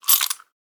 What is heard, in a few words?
coin sound; game inn